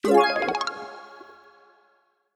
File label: win medium win; reef raider